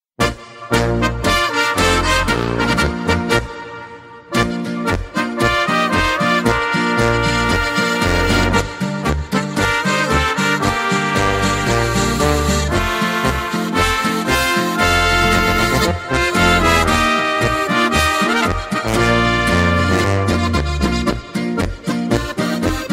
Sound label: ringtone